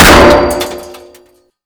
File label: Vehicle Weapons; Red Orchestra Ostfront 41-45